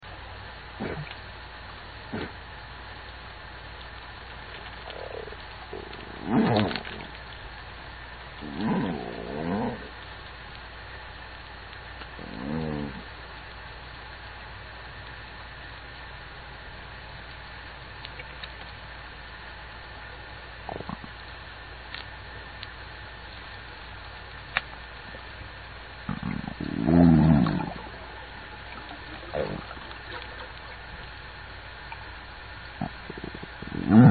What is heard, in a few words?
steller roars maybe